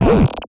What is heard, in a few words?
interface error, error tones; Multiple interface error tones at various pitches. Error, Interface Pitch, Tone Web Efx, Error